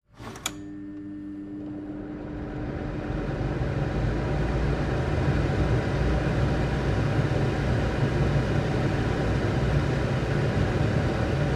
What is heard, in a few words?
Hood Cooking Aspirato, Hood, Cooking Aspirator, Gears; aspirators